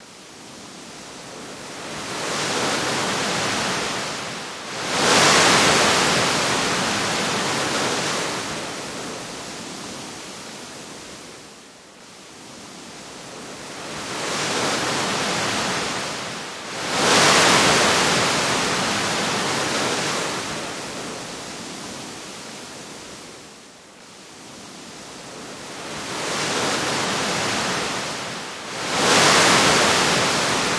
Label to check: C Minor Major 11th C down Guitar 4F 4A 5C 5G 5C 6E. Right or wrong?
Wrong